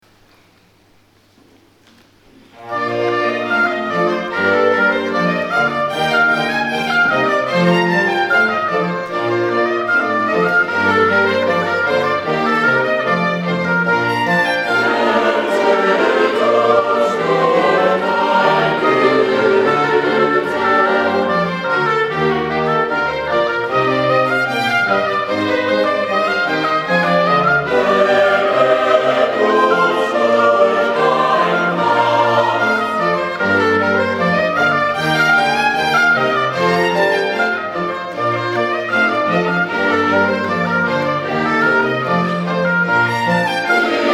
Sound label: Chorale; BWV022 Jesus nahm zu sich die Zwölfe